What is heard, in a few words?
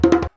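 100 JEMB, djembe; Univers Sons; Basicussions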